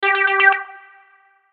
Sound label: Logo Synth Rhythm Reverb; Blastwave